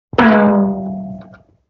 Can Hit; Vintage Cartoon; Drums & Percussion

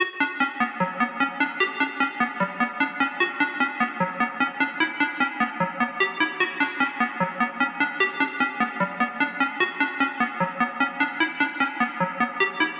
150 BPM B Min ARP2600 Plucks; Synth; Loop Stems; Cymatics; Fantasy Synth Sample Pack; Universal

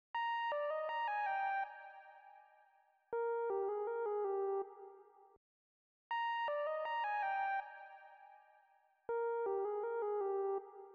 161 BPM C Min Lead, loop stems; Melody; Cymatics; 2022 Melody Collection - Wavy; Location

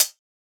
Closed Hat; Ghosthack Advent Calendar 2017 Day 5

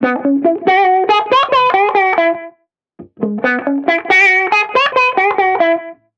Reggae Guitar Loop 140 BPM E Maj; Vibrations; Cymatics; Vibrations Guitar Sample Pack

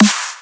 Snare, G#; Dubstep; Drums; One Shots; Snares; Cymatics; Dubstep Starter Pack

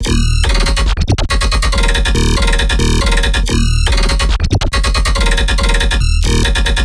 Melodic Bass Loop D# 140, Color Bass; Loops; Cubistic; Ghosthack - Color Bass Essentials

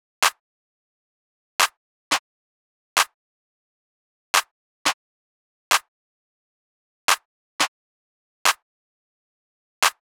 Drum Loop - 175 BPM Clap; Loop Stems; Cymatics; DOPE DRUMS VOL. 1; Jumpshot

